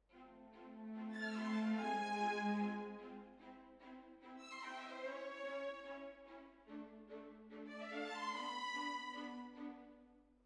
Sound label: Hall, Jazz for piano